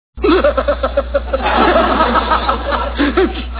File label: laugh